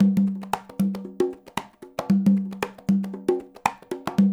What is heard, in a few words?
loop, congas; Percussion; InVision Interactive; Percussion Slam